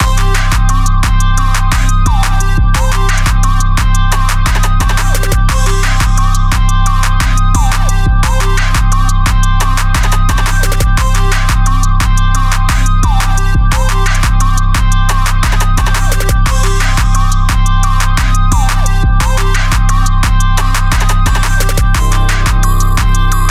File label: Hoodtrap, Jerk Trap beat; crisp drums, deep 808s